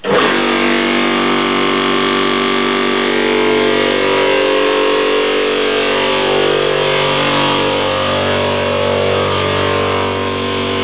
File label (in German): KOMPRESS